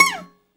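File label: bouzouki glissando down C 3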